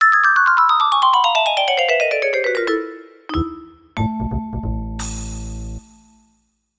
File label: Haunted Lose